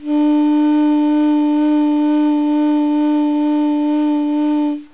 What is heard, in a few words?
FLUTE